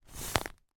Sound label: toy inflatable figure squeeze air rush rubber squeak